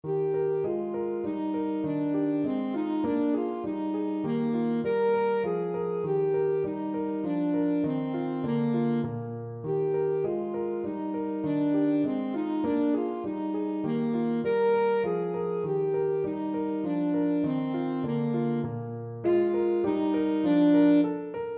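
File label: alto saxophone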